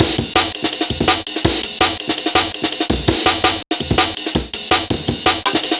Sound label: amen tick